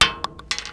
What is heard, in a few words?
metal; Mafie 1